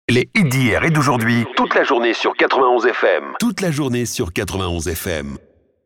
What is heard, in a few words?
Drop Jingle